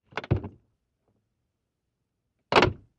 VEHICLES - AUTO ACCESSORIES: Old automobile door open and close.; accessories doors